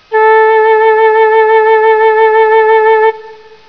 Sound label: flute